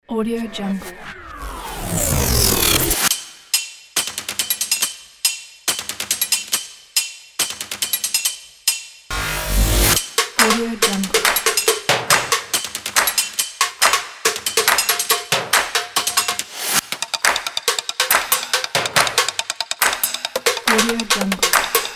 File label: dynamic drum line